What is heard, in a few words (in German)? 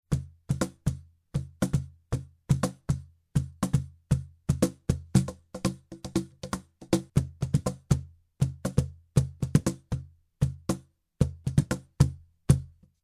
Cajon Groove, Shure SM 57 Front Pur Cajon unbearbeitet